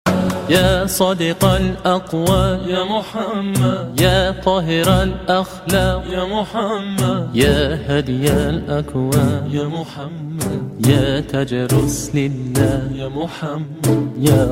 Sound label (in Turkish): Islami zil sesleri